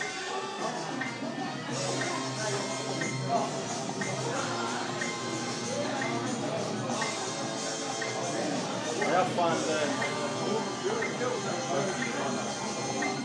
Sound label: alarm; counting response time